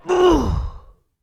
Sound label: Injured Ostrons Grunt; Warframe; Tutorial